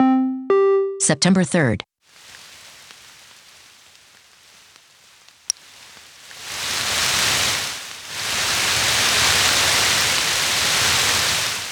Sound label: rain sonification; Hermine